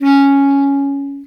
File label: Bass Clarinet C#3; DirectWave; Varazdin Orchestral